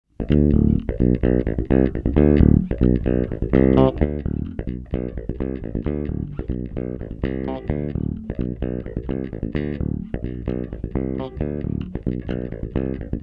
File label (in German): trocken Comp EQ Ultrabass